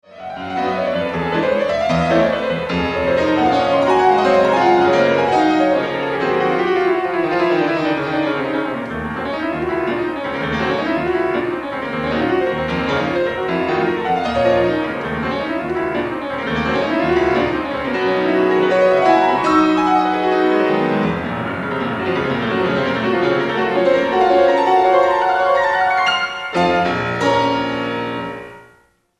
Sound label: Prelude in Bb Minor Presto con fuoco